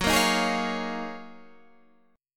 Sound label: D sharp Minor 11th F sharp, chord